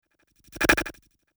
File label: Strobe, transition